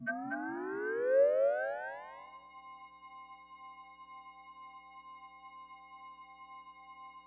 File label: Synth, Breakbeat